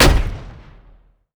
Hit In, Damage; DCS

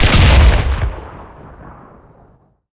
cannon 37mm shot, cannons tanks

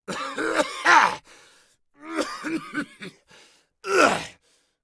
agony; Escape from Tarkov

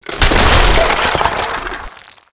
fence stone wood; World of Tanks Blitz

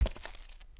Animal Foot Large Tund; Civ4 warlords

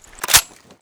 scarh button; Escape from Tarkov

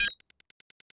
bomb tick digital; Call of Duty Modern Warfare

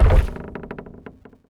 wood land

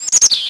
birds; World of Tanks Blitz; hangar 9 may

